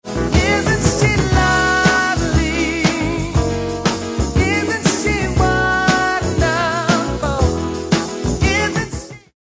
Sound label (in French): ternaire